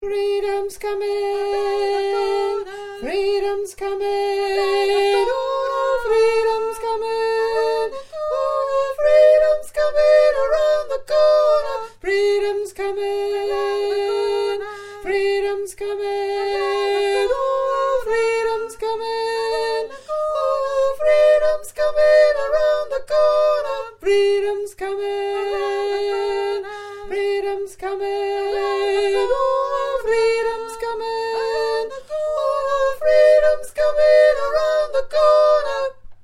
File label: mix tenor